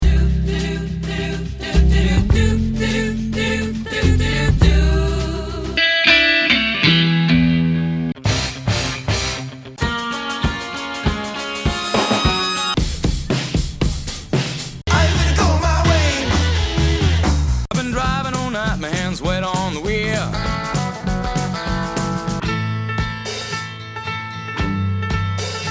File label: Rock